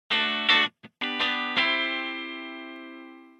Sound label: guitar sound